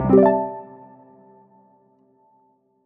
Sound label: Goal